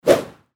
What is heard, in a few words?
sword swish